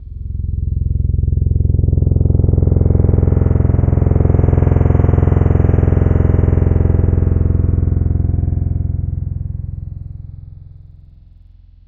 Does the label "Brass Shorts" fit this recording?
Yes